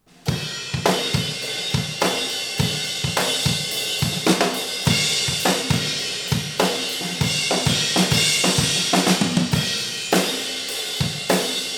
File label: cymbal shield